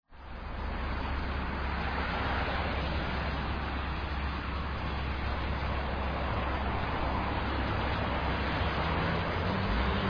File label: bgm; SniperLaya